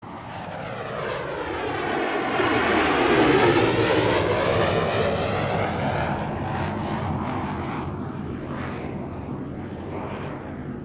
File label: takeoff